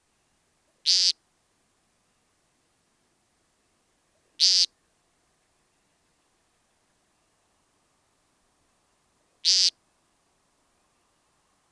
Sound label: american woodcock; Superbly camouflaged against the leaf litter, the brown-mottled American Woodcock walks slowly